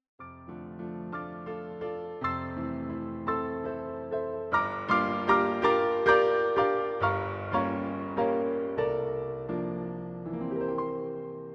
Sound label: Toyland Christmas piano roll